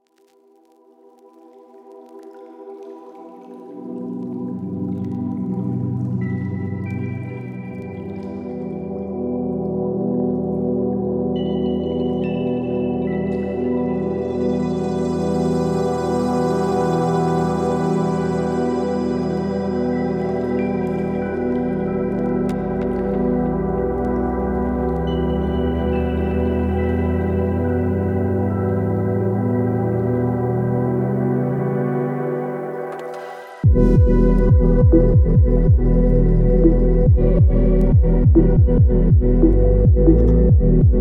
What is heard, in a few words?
Ambient Future Garage